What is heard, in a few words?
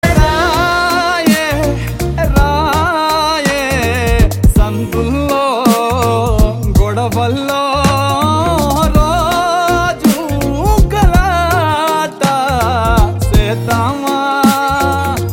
BGM Ringtone; Bomma Blockbuster